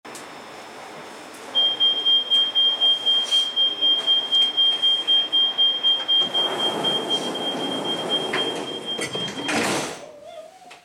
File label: Metro G5 door close, Metro G5 dørlukning; Emil Glentvor, Oktober 2022, Metro